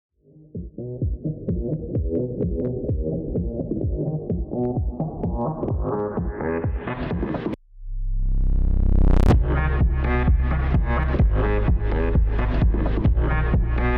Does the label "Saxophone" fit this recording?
Yes